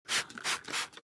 Spray from a plastic cleaning bottle

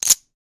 Poker chips stack quickly

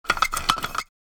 Silicone sealant gun single application; hand tool

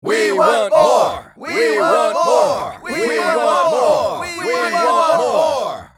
Male voices chanting we want more; crowd

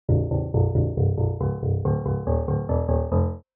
Cartoon angry walk

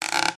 Squeaking wood opening of a box

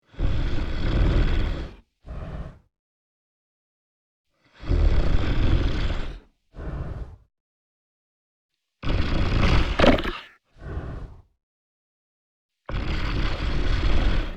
Dragon snoring sleeping

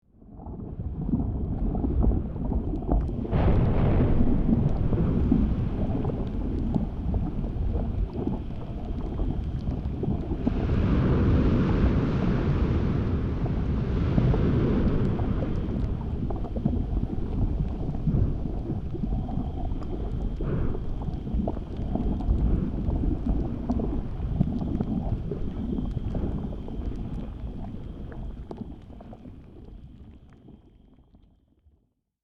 Lava lake bubbling magma loop; volcano

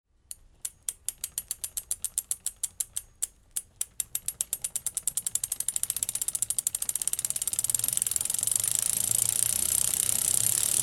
Bicycle freewheel spinning increasing speed

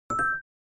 Simple message notification

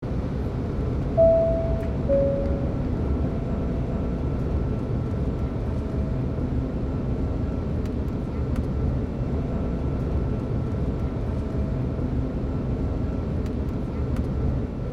Airplane seatbelt ding fasten seatbelt signal; vehicle

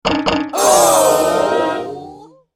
Game show wrong answer; film and tv

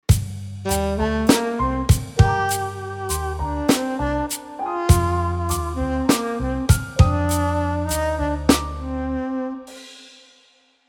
Romantic scene sexy love sitcom music

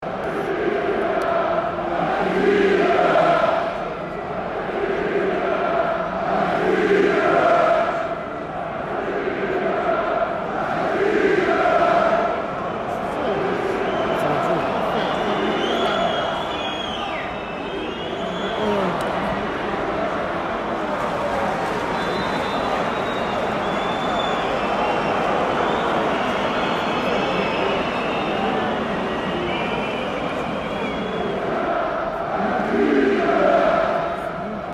Stadium crowd cheering and chanting ambience

